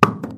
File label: paintcan impact soft; physics; metal